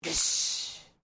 MALE EXCLAIM; Male Vocal sounds; Exclamation Sound Effects; Exclamations (Sound Effects:Voice)

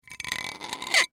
Spigot Turn Rusty, Spigot Turn Rusty Metal Squeak; Squeaks; Household; Blastwave

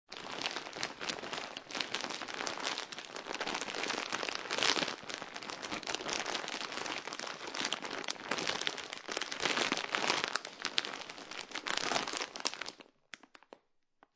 Bag Plastic Being Crumbled

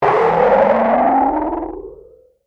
Hit Flange Fuzz; Hit Sound Effects; Sound Design

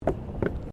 Tram Horse Drawn Step Wooden Bridge; Horse drawn tramcar; Carriage Sound Effects; Carriages (Sound Effects:Vehicles)

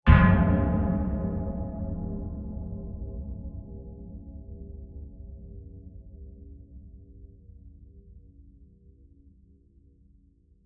Long Deep Metal Impact; Blasts; Impacts; Crafted Signals

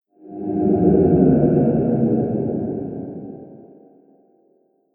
Ghost, horror sound effect; Andrew Fleming

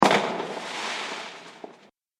Fireworks Single Bang And Flare; Firework Sound Effect; Andrew Fleming

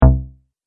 Chunky Button; Button Sound Effects

